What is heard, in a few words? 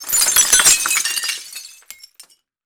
glass window pane breakout; Explosions